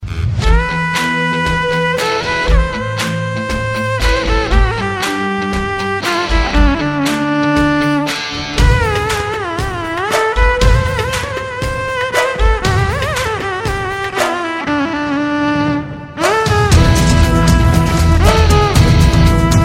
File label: violin